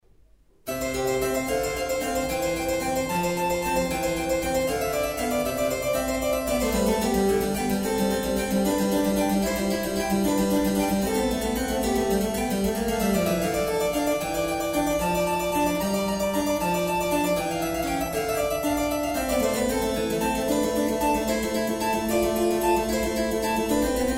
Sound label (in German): Klavier